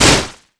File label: glaive hit wall